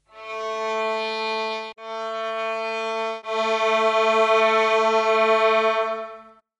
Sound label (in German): geige